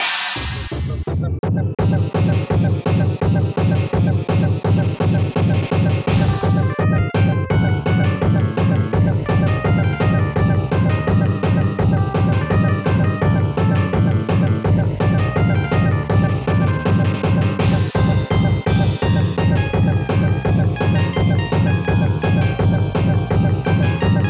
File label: Heartbeat, Twist; Mr. Batty